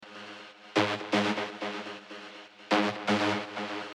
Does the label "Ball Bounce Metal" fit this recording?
No